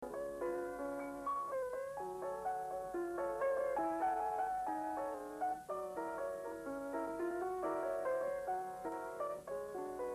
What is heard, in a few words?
kino piano